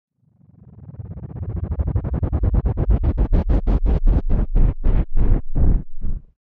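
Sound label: Chopper Heli Shutdown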